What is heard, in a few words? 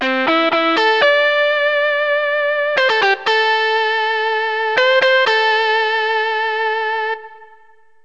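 overdrive; Roland; An Invitation To The Roland World Of Music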